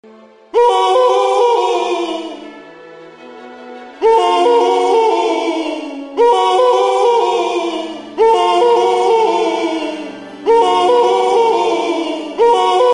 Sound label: spooky tree game sound; coc